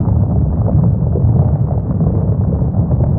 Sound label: Asteroid loop